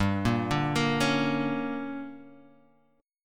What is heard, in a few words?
D Major 7th Suspended 4th Sharp 5th, chord